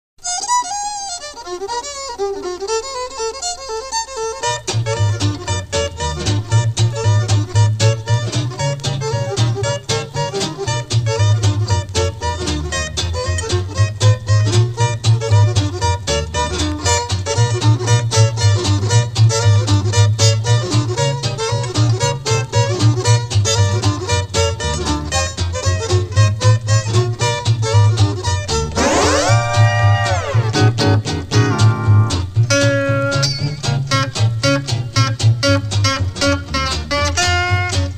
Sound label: Crazy Fiddle